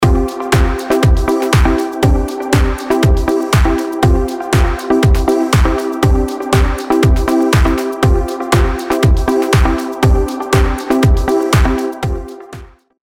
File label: Csus4 C Csus2 C